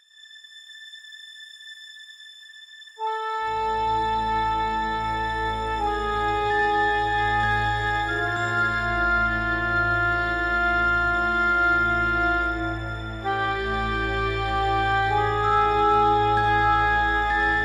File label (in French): série mystic attente